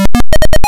full heal; Shadow Knights

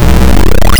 FULL MAGIC; Shadow Knights

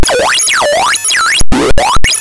extra man sound; Keen1

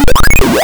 GOT ITEM SND; Keen 2

